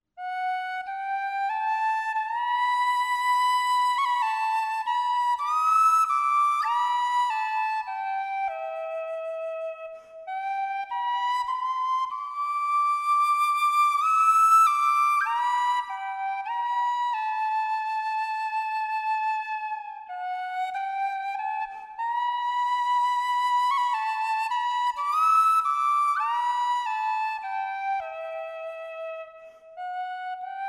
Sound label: whistle